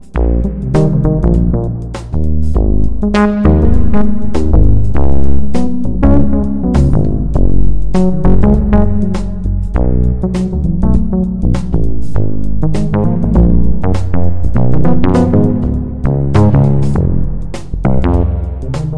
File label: bass ambience